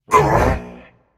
surprised, voicepack; smite; jorm

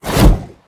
swipe; smite; god cthulhu

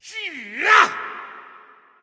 grunt; smite; guan yu

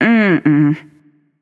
nv disagree; smite; awilix

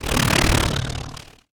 wing; smite; khepri

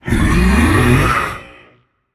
breath, voicepack; smite; jorm

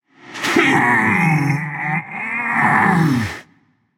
grunt lift; smite; agni

